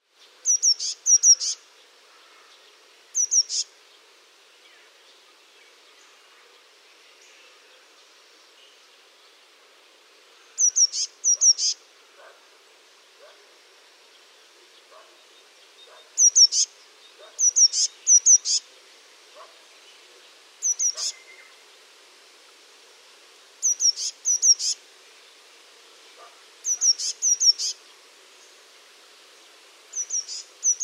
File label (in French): MESANGE BLEUE